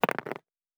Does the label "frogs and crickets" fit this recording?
No